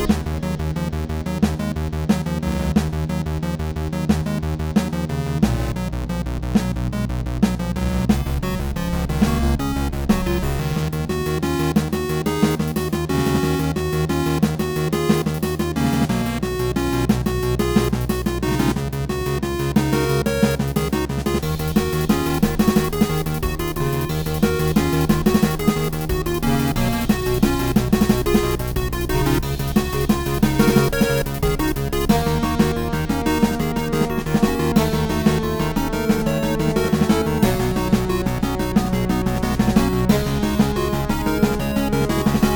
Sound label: final stand phase